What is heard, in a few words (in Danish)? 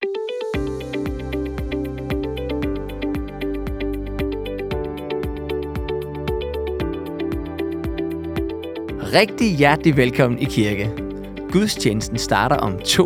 Gudstjenestestart jingle